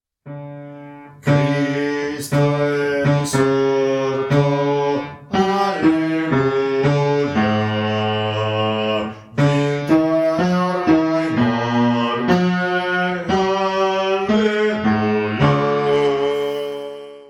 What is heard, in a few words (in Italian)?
bassi; Cristo e risorto